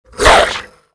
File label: werewolf vocal bark; Monster Within; soundmorph